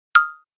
Cartoon idea pop

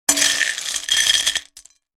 Breakfast cereal pouring in a glass bowl

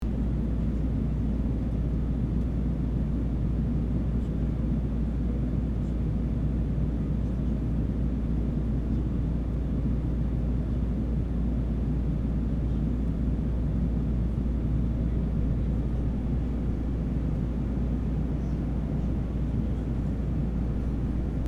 Rumbling interior airplane inside ambience; aircraft

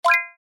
User interface confirm beep

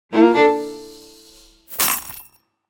Mobile game nordic vikings reward

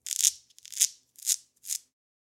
Vegetable peeling with peeler; cooking

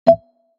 Sports athletics game menu ui select

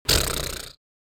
Human funny raspberry

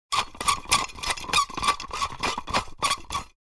Rusty throttle controller friction movement

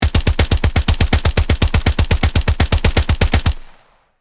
M4 assault rifle full auto fire

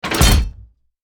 Armored tank cannon loading

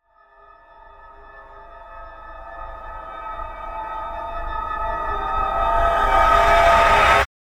Granular madness riser

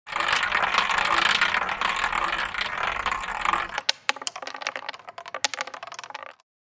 Casino game roulette wheel spin

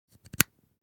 Camera connecting to charger usb cable

